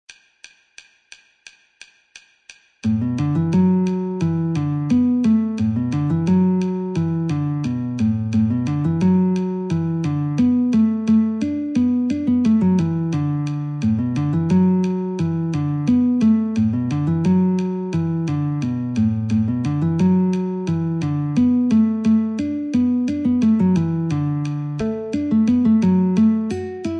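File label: D Guitar metronome 88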